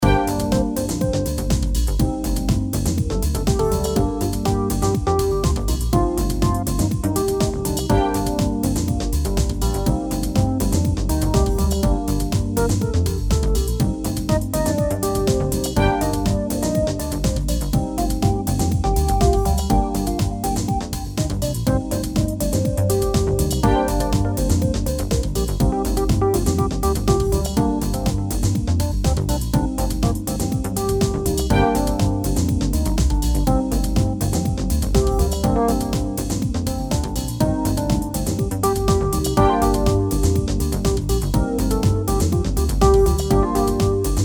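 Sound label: rock fusion g maj 122bpm keyboard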